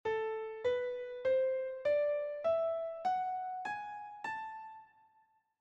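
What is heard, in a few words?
A melodic minor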